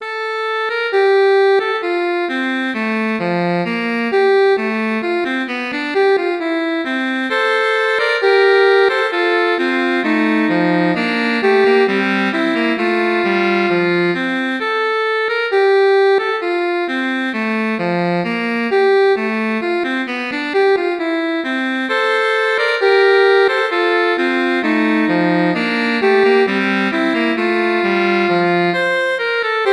alto saxophone

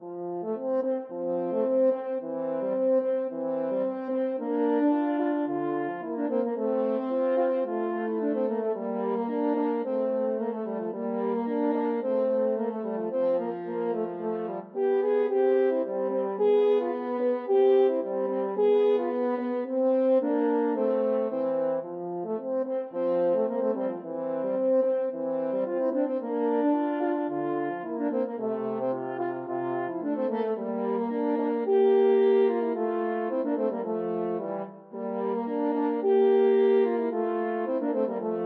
french horn duet

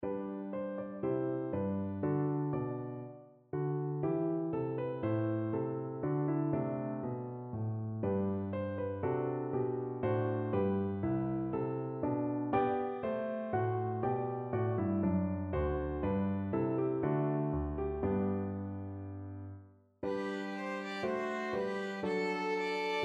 silent worship, violin duet

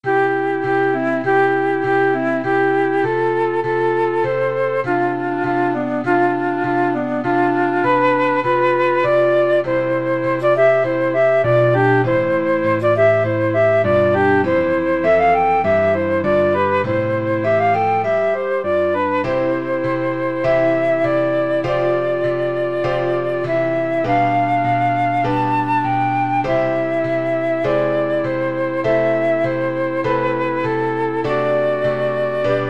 flute clarinet duet

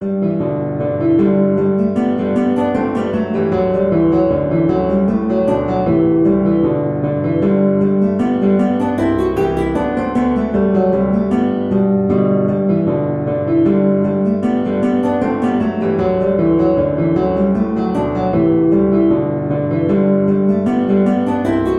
GTR PNO; guitar; The Flowers Of Edinburgh

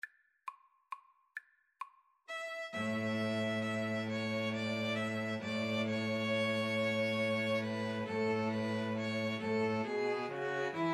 String trio

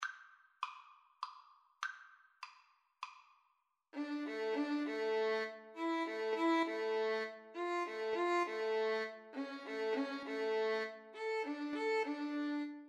Les Coucous Benevoles, Violin-Cello Duet

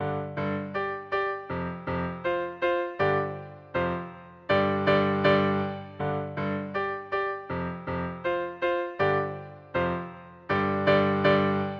thumping blues, piano

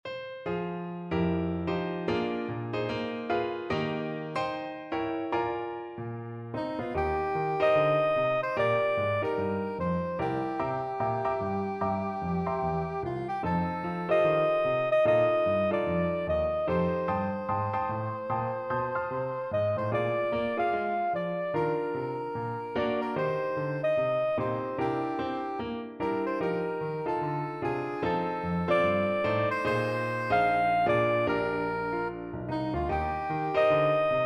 soprano saxophone

